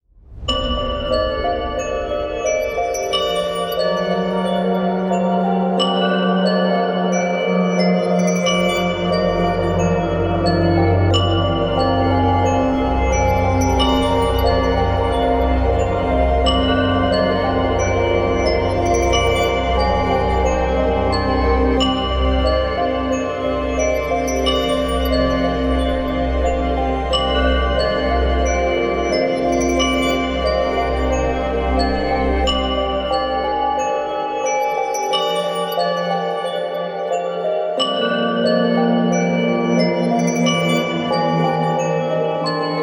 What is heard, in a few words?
Feeling Nervous suspense bells; schockierend